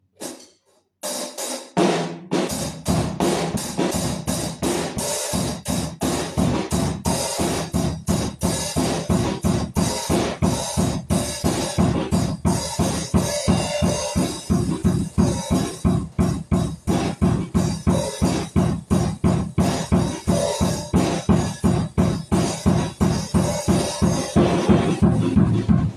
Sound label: drums